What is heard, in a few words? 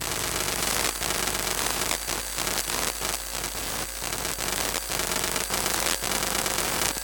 Electromagnetic Field Sound